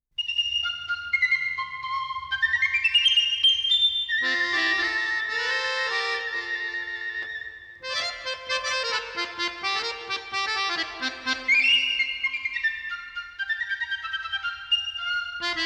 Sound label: horn pipe; admirals